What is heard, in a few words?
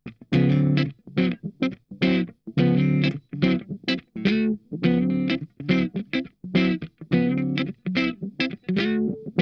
STOP START, Funk Guitar; Zero G - Funk Guitar